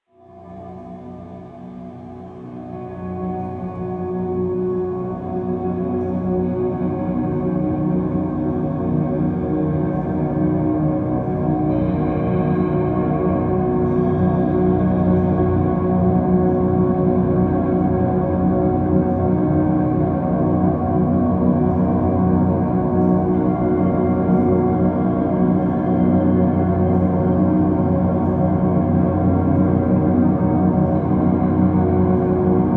Standing Waves

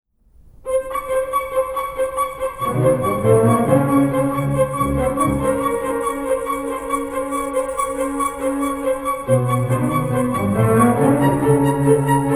Variations & Fugue. String orchestra

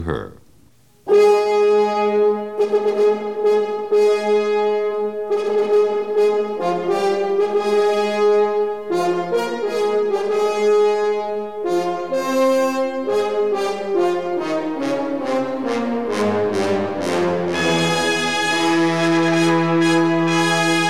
Symphony No 4 in F Minor, Op 36 Andante sostenuto; Tchaikovsky

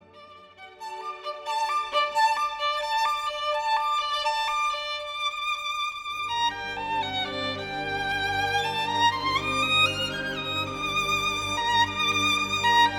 Violin; Beethoven Violin 2; Philharmonia Orchestra; Giulini

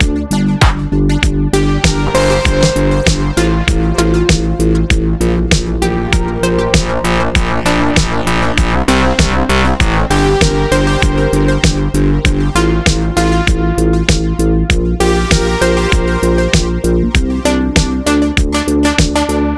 calm

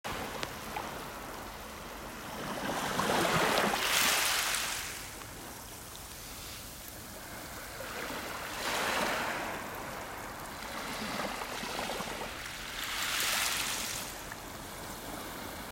Ocean shells

